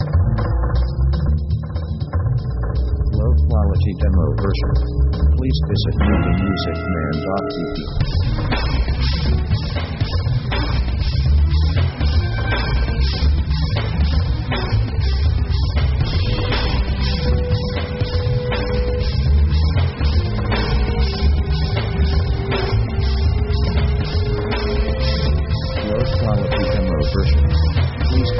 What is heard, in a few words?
orchestra action